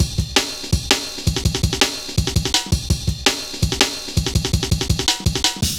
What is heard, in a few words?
Rollin' Ride, Jungle Warfare, Drum Loops, 165.5